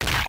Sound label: Punch; RedDot